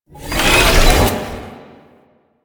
Necramech Ranged Spin Attack Charge; Enemies; Warframe

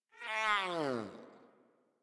Kitten Yawn; Enemies; Warframe; Catbrow

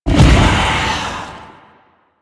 Wraith Leap Jump; Enemies; Warframe; Zariman

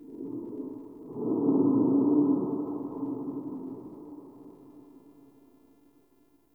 Texture Interior Small; Sentient Lair; Ambience; Warframe

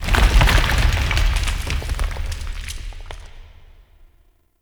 Rock Movement, Asteroid; Ambience; Warframe; Grineer Asteroid

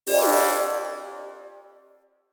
Mission UI Close; Zariman

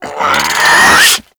Infested Charger Run Breath; Warframe

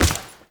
Step Default, Footsteps

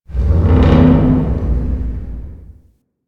Metal Flex, Ambience; Warframe; Corpus Railjack

